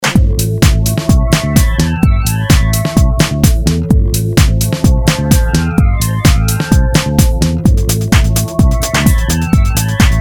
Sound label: Piano Funk Extended Mix